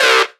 Synths Root C

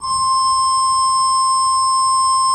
C5, Cathedral Organ; ILIO; Propeller Islands Cathedral Organ

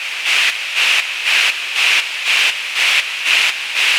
Gated Noise 120BPM; musicradar

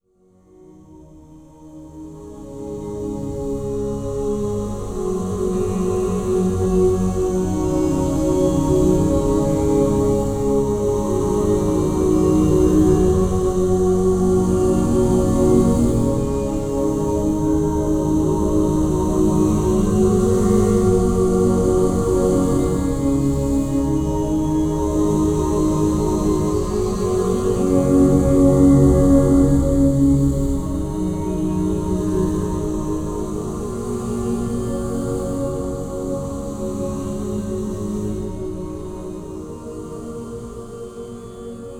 Low Complex Voice, Complex Wave; Sonic Foundry (Sony Creative Software) - James Johnson Spektral Minimalism

